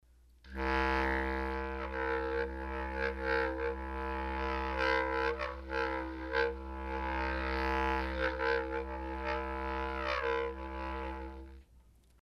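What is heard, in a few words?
bass clarinet didjeridoo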